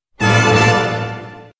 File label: Troumpet